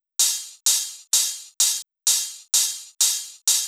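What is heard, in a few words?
Drum Loop 128 BPM hi-hat; bsounds Vol.5; VEC5 Drumloops; VEC5 Multi Loops; Drum Loop 40 Loop Elements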